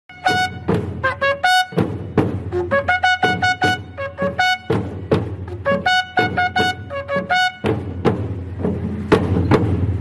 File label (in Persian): Tabl Senj Moharram; بی کلام